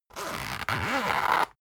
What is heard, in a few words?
zipper run long slow